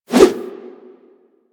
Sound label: Melee Idle Whoosh; Warframe